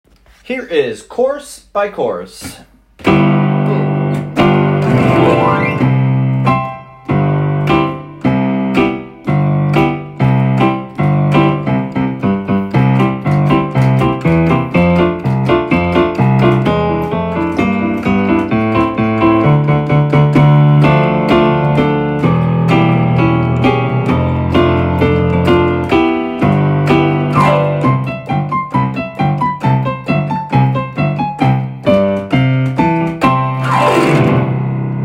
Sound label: Dance Combo Music